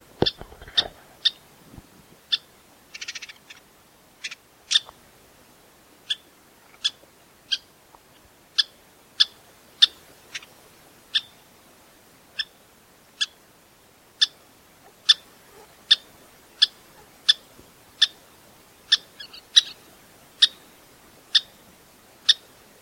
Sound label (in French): tyran de l ouest, chant